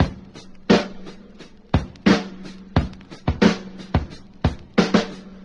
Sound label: sudden stuttering, Boombap Drum loops